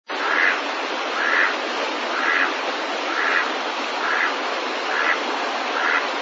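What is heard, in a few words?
mallard; magamo; 身近な場所で聞く鳥の声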